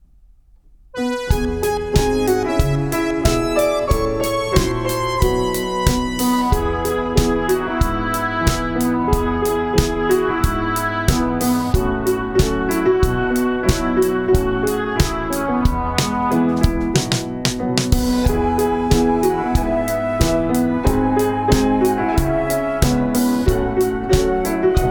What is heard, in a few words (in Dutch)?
Instrumentaal een feest voor ieder kind